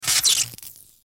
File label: zap; adventurecat; peacefree